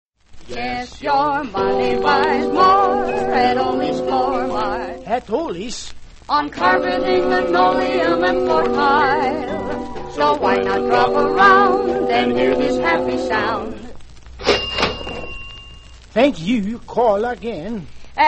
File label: radio jingles; oles floor mart; allied radio artists